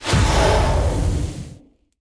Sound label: Finalboss Following skull hit